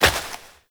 Step Flesh; Warframe; Koumei

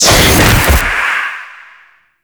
Teleport Cast; Warframe; Stalker

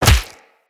Melee Fist Impact Flesh

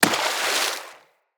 Melee Impact Water

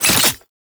Wrist Gun React; Warframe; Tenno; Incarnon

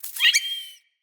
Floppet Single Squeak, Animals, Vocals

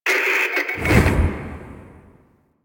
Super Weapon Call In; Warframe; Caliber Chicks Minigame; Weapons

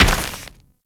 Moa Combined Step Dirt, Footsteps; Warframe

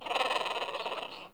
Bird Of Prey Vocal Perched Ground

